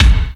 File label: KICK, Hip Hop